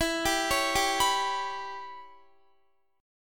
E Minor 6th E, Guitar; Em6; arpeggiated

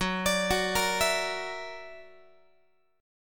Gb Augmented 7th, down, Guitar; Gb+7 arpeggiated

